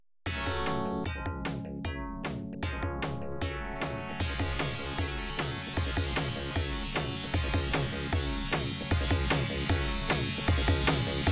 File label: Catwalk Timer, pinball